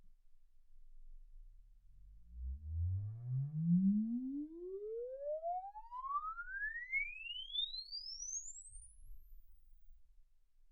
exp swept sinus 10Hz 24kHz

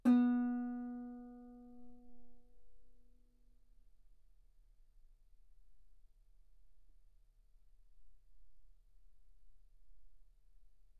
Guitar ff B3

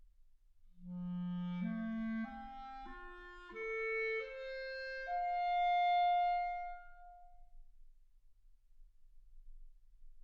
clarinet

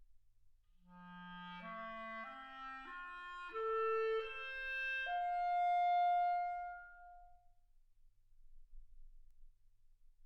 clarinet

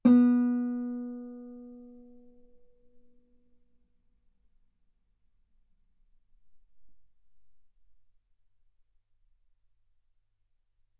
Guitar B3; ff; sul B

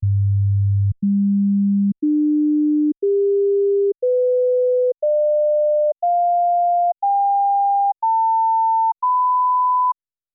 sinus tones 48kHz; OmniSource

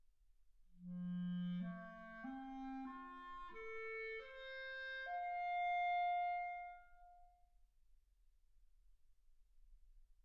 clarinet

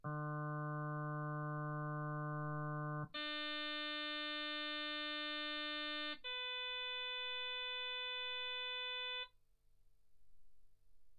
harm sinus 48kHz

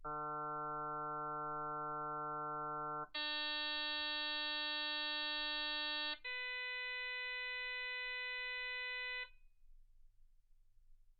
harm sinus 48kHz

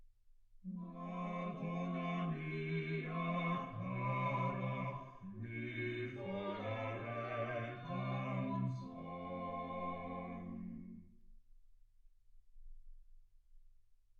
quartet

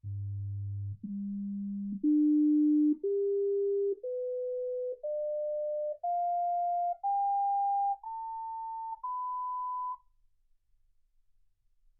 sinus tones 48kHz

